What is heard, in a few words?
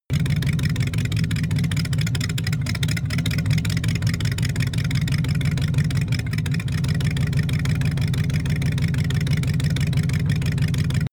small engine idle